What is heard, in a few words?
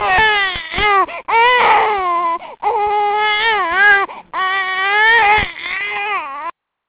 crying